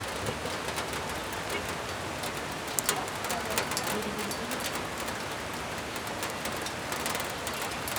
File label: Rain Atmos